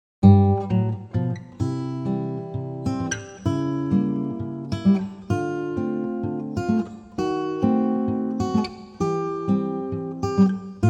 Steel String Guitar Version

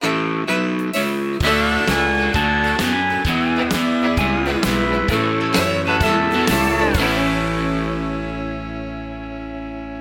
Steel Swing

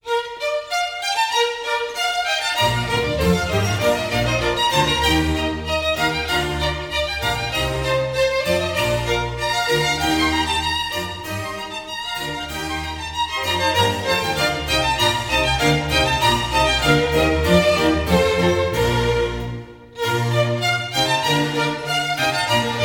Venetian Court Concerto

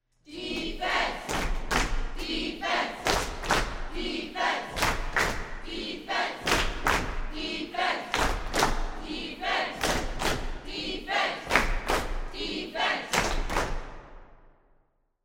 CROWD CHANT

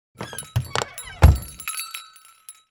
DOOR BELL

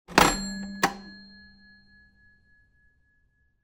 Clock Time Antique Punch

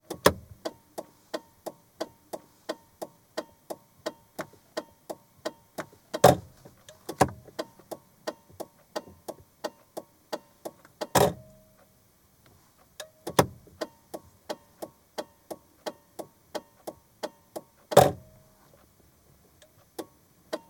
Auto Volvo 245

